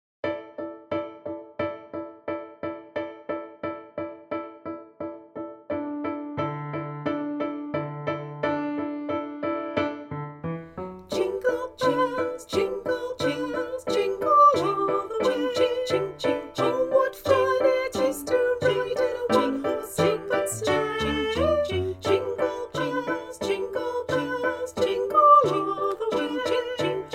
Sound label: jingle bells full vocal mix